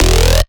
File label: bass hit acid whomp E; Virtual Riot